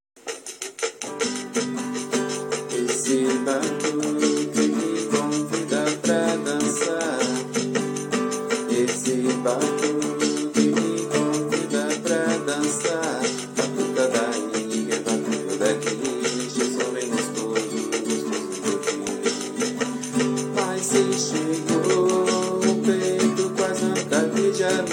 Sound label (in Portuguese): batuque